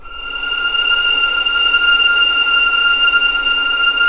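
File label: F6 legato mf violins R; East West; The Ultimate String Collection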